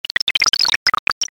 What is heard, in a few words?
wash hands